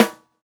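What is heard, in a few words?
snare, Roland MV8800 drum kit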